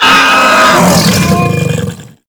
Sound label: Infested Juggernaut Vocal Death; Warframe